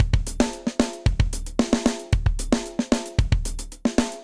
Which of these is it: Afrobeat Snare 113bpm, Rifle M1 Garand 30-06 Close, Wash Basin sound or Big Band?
Afrobeat Snare 113bpm